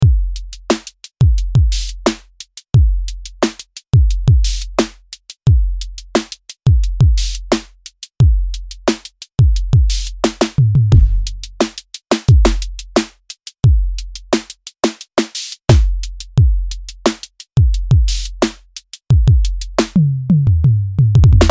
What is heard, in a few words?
Audiothingies DoubleDrummer KoolKit no FX